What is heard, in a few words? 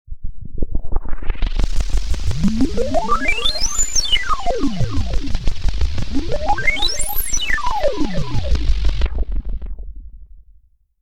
Electronic Tune Up; Stingers